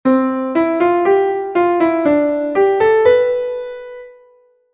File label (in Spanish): tonalidad tension